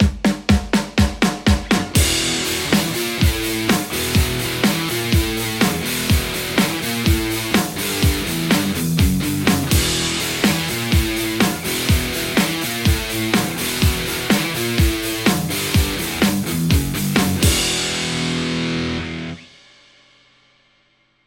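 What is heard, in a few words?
Telecaster; aradaz white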